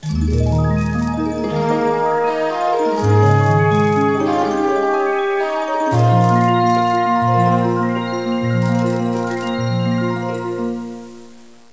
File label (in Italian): musica newage